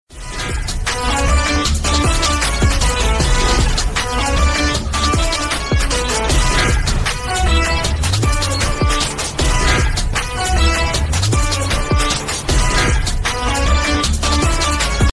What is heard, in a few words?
bgm ringtone tamil song bgm